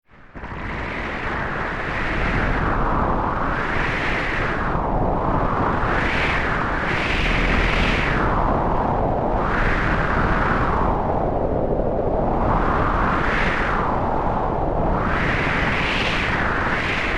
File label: Space Storm SciFi Violent Windy Static Distortion; Space Storm Violent Windy Static Distortion Ambience Scary Dark Ominous Scifi Science Fict